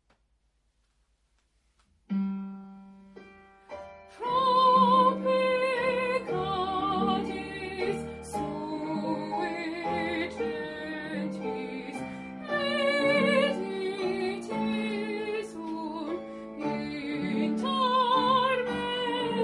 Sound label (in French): stabat mater alto partie; Grandval n4